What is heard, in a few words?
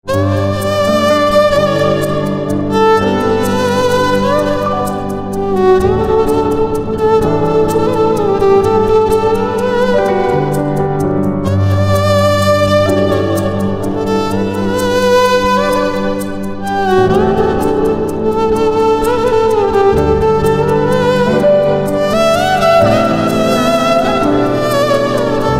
Violin